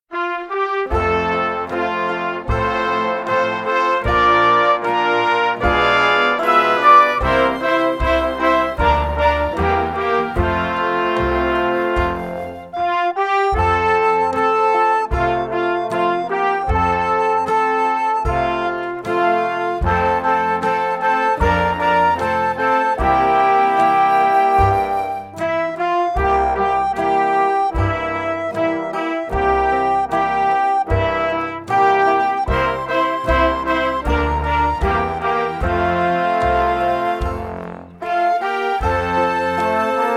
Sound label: Polly Wolly Doodle Slow